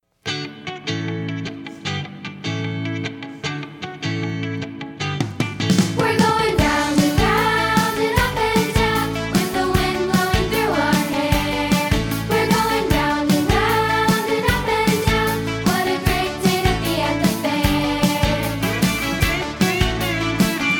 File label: instruments